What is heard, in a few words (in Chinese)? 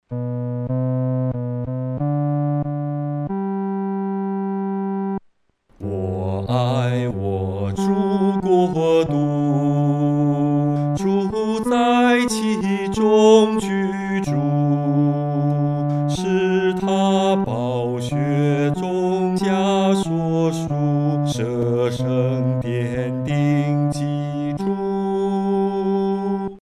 我爱我主国度 独唱（第四声）